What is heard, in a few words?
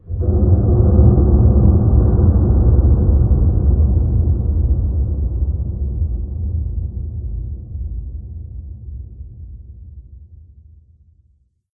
amb boomer; video games; ambient; random abstract; source engine; portal2